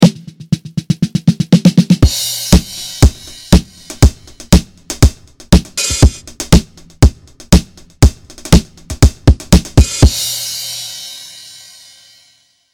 Disco, drumkits for reproducing the typical sound of the '70s